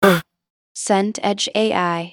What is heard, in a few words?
breath sharp in